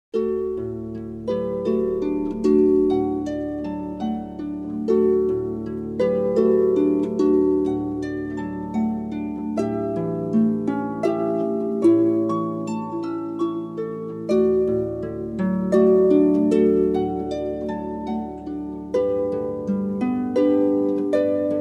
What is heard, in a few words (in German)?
Konzert Harfe